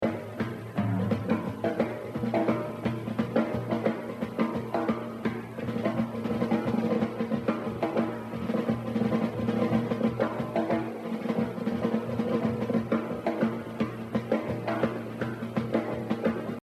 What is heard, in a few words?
Jazz Drumming; The Evolution of Jazz Drumming (Gottlieb)